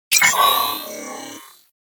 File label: weapon warning, ships combat; CosmicRage